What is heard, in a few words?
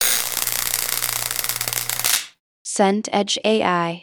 welder arc